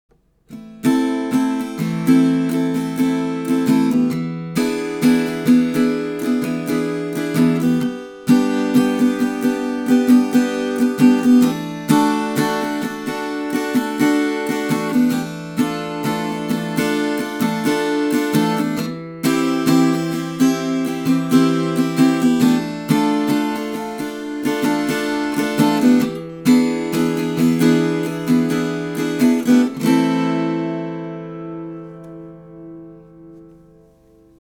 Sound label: Nash Acoustic, Nashville Tuning